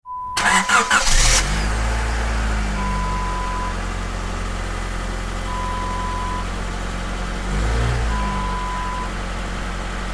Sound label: Car